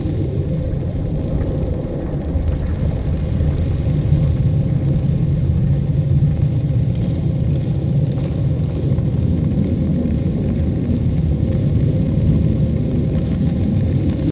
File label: planet; Far Gate; ENGLISH